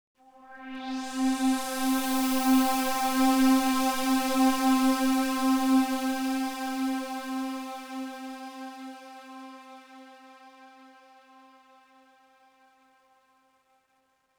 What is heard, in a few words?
Oneshot Synth; Zombie Army 4 Dead War